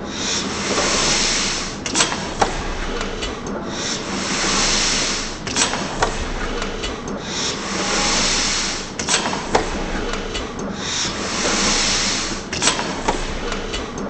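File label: ambience industrial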